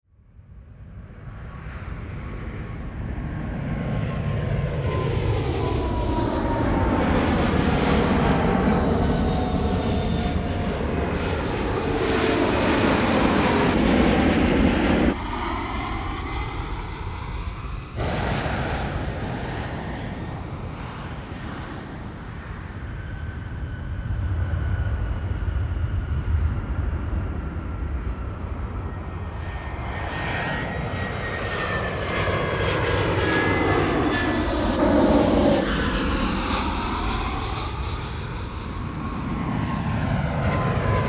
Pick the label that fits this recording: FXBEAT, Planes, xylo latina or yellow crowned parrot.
Planes